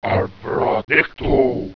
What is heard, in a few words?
SPELL; sound effect